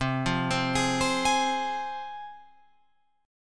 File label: C Suspended 4th Sharp 5th, C, down, Guitar, Open F; Csus4#5 arpeggiated